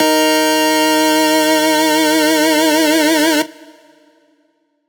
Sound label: Riddim Melodic Hit - D#; One-Shots; New Challenger; Ghosthack; Riddim Essentials Volume 2